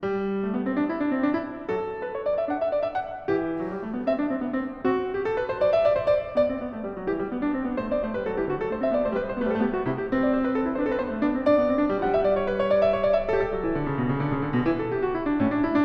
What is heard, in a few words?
Canone alla Nona a 2 Clav; Bach Goldberg Variations BWV 988 (Live)